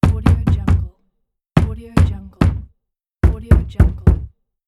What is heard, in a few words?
knock on wooden door